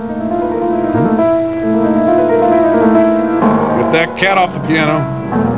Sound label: cat piano